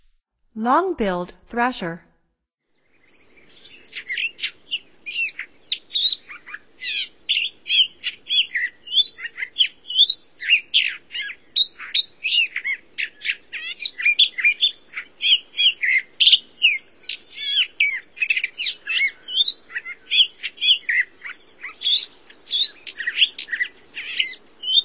Long-billed Thrasher, Songs of Birds of North America; Stokes